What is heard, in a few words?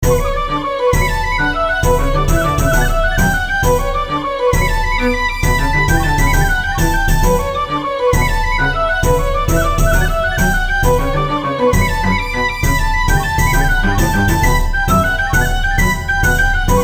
Irish Tin Whistle